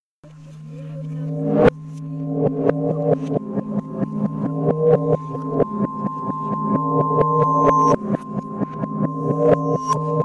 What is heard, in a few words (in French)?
atelier musique